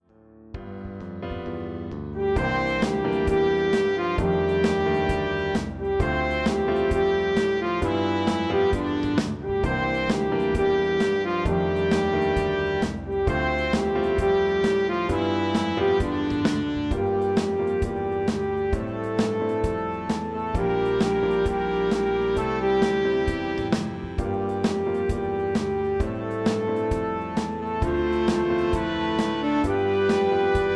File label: for Jazz and Mixed Band